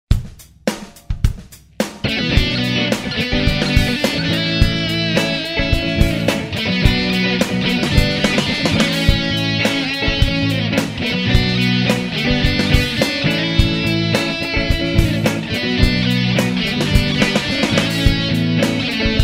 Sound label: guitar